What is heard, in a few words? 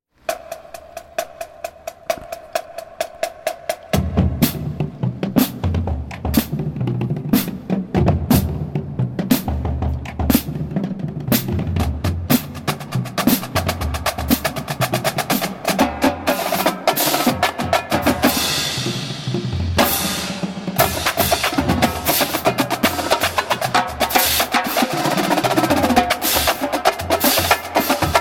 Funky Feet Drumline, marching band; Nicks Funky Feet Drumline